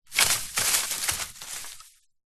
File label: tree branch drop ground long grass; foley